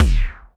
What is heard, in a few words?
BD, Bassdrums, Roland TD-20